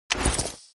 Click icon

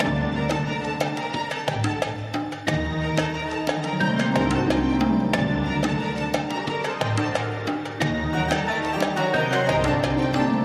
Change Free Bgm

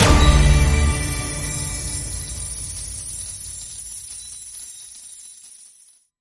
Big Win End

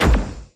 Stop Roller Fast

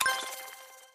Click Spin

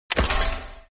Click icon

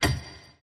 Slot Msg Normal Win

